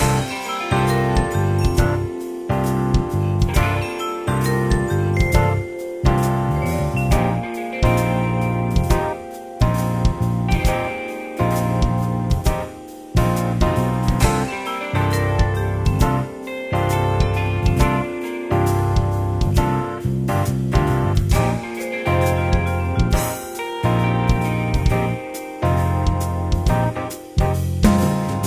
Music; Diner Dash 5 Boom

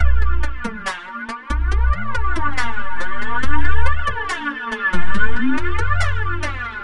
Music Loop; Verti Golf Online

